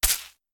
Hit Light Forest; wildworlds

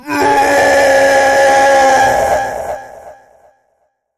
big win scream; wild worlds

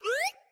pop Open; willyshotchillies

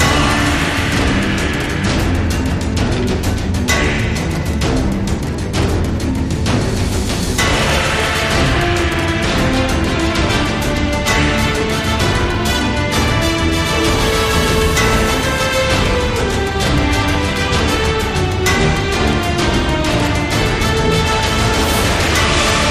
ambience Free Spin; warlords

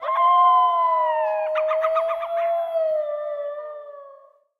howl; the wolfs night

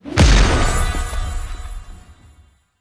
random Wild Hammer; warlords

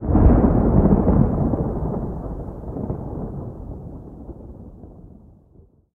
ambience thunder; silverback

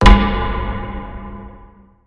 random ended; silverback

